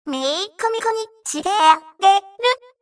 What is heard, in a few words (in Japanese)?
gachagacha